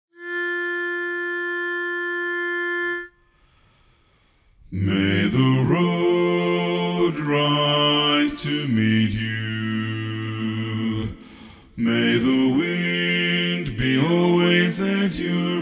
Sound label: Irish Blessing - Bass Loudest